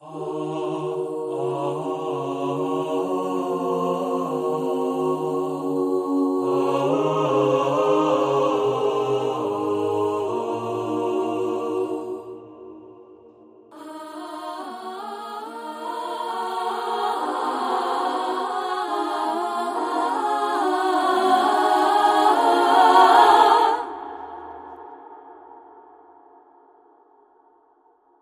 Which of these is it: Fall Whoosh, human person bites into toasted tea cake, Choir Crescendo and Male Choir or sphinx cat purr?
Choir Crescendo and Male Choir